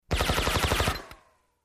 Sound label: Silenced Machine Gun